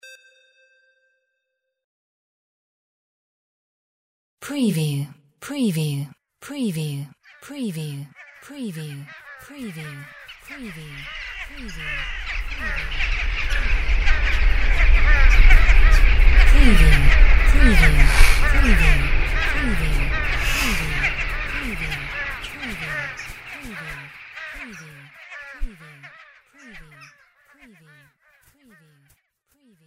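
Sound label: animal crows murder LFE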